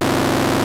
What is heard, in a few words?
ANALOG FX, OTHER SYNTHS; Rubber Chicken Software Sample Bank